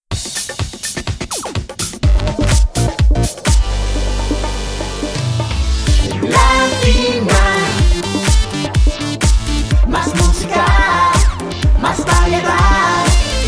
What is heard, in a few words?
Latina Radio Jingle